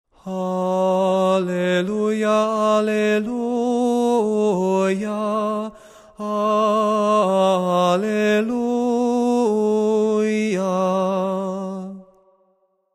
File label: Tone 6 Alleluia